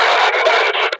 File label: Pain; Warframe; Lasrian; Battle Barks